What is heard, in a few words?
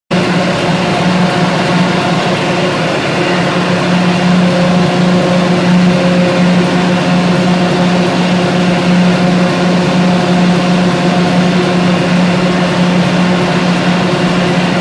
Singlewid, Singlewidth web-offset press run; 1960 onward; Machinery; BBC